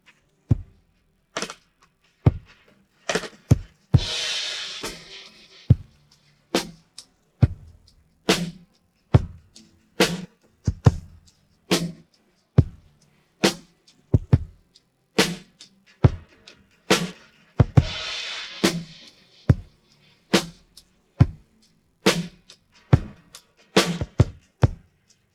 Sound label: pattern, drums